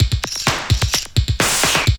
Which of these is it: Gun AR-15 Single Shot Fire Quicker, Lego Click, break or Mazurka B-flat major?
break